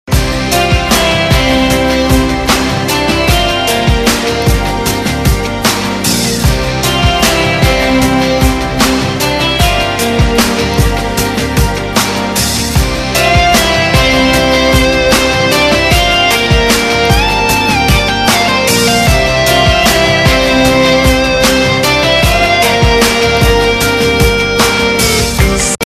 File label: Power Ballad Music; Bollywood